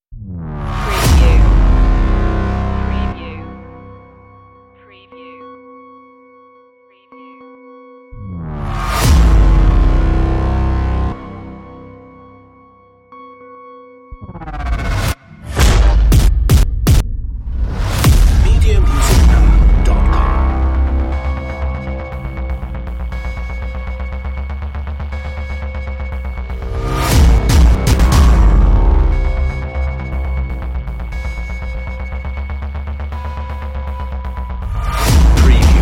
no string fx

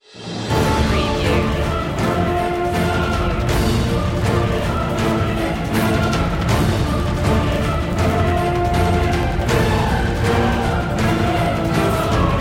with choir

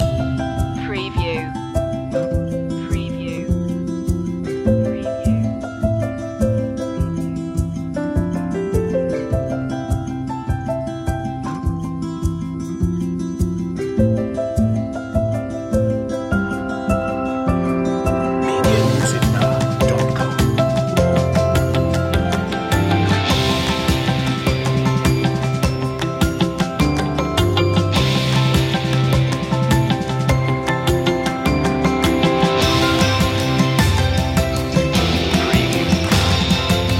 shining through no vocals

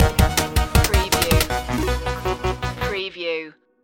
retro video game countdown to zero stinger

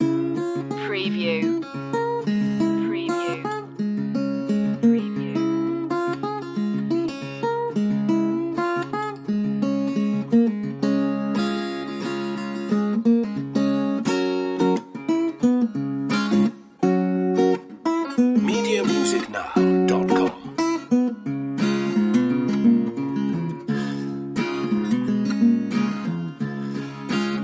blues loop

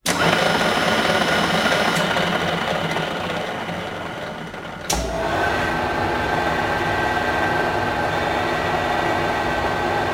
Mill Type 2

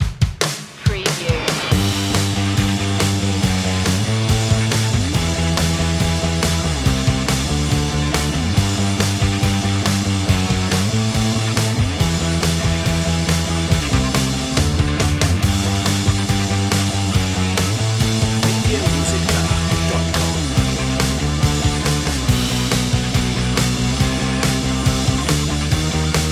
intense rock

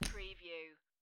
Poker Chips Place Stack Of Chips Gently On Felt

